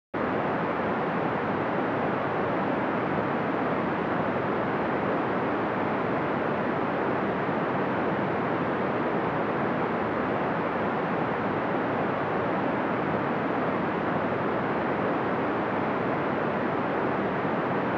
Pink noise bands and phase check, Low mid 200 Hz & phase check